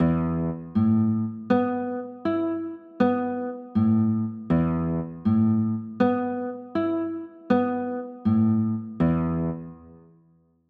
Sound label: e sus4, chords